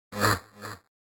laughs

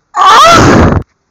aaaaaagh

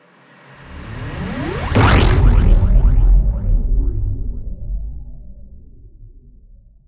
command block reactivate

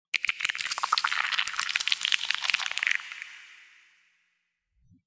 slime

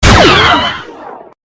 blaster

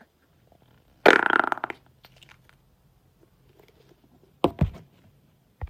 squishhhhh splat

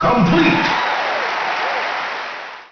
complete

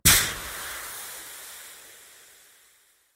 smoke explosion; yeeps

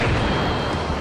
ultraman clash

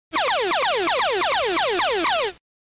daily double low volume

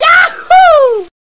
yaaahoo

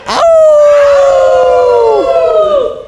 aauuuuuu

